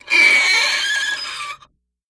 Chalk Screech Blac, squeak

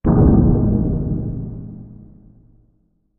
Bass Impact Tonal Be, impacts

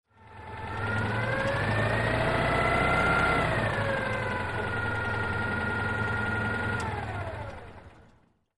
Citroen Deux Chevaux, Engine Idle, Rev, Off

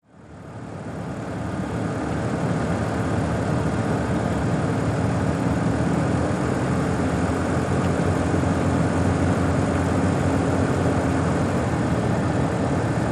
Sedan Drive 35m Stop

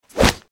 Medium Speed Air Whoosh

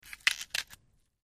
Break Or Snap Vegetab; punches kicks hits bones

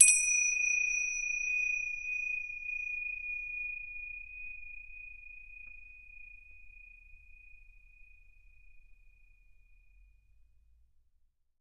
Tibetan Bell Strike, bells

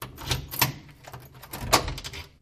Dead Bolt Unlock Key, lock & keys; Dead Bolt Unlock with Key

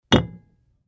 Beer Mug Thump Clinks

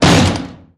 Heavy Object Landing

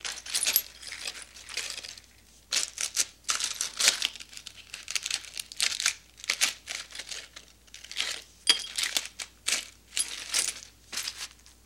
Gritty Mvmt On Glass

